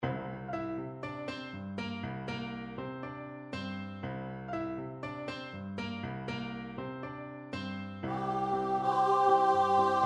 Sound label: Piano and Vocals